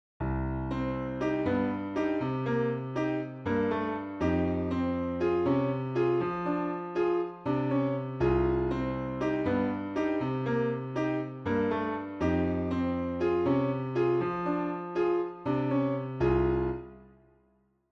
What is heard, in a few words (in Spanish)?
montuno enchainement